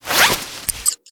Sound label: Camp skill bandage